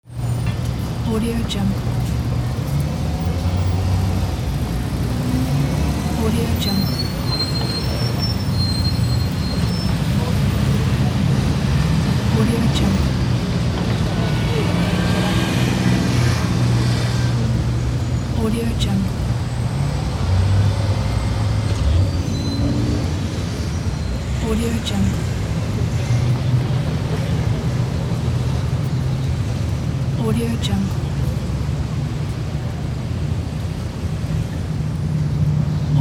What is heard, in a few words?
city rumble traffic